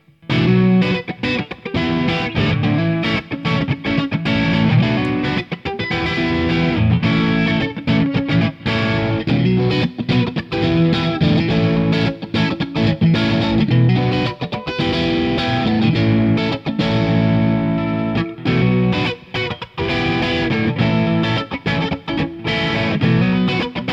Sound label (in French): rock 5p funky, guitare